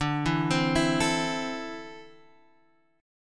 D 7th Suspended 2nd down Guitar Open G

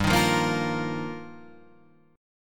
G Minor 7th Sharp 5th G down; strummed; JGuitar

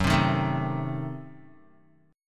Gb Diminished 7th, Guitar; strummed; JGuitar

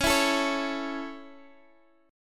A Major C sharp, chord

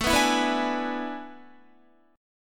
A Major 7th Suspended 2nd Suspended 4th, Guitar; AM7sus2sus4; strummed; 17,14,14,16,14,15

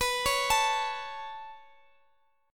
B 7th Suspended 2nd, Guitar, Open D

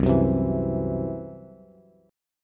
C Major Flat 5th, C, down, Bass

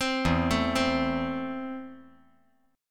E Suspended 4th Sharp 5th

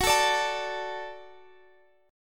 Gb Minor Sharp 5th Gb, down, Guitar, Standard; strummed; capo 9; chordshape

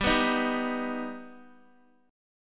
G Augmented G Guitar; strummed

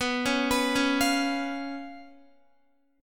B Suspended 2nd Flat 5th B down Guitar; arpeggiated; JGuitar

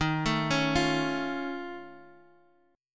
F Augmented 7th Eb, chord

